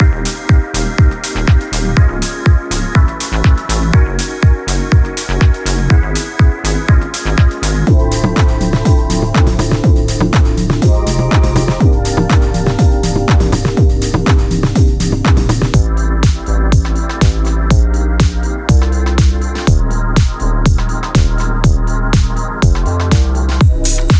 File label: lofi house drums